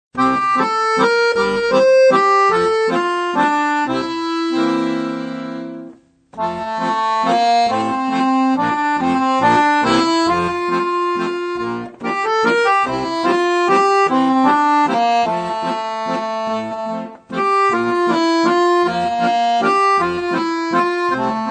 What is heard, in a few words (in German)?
Die klassische Dur-Kadenz, Akkordeon; Cent Mille Chansons; Akkordeon Workshop Band 2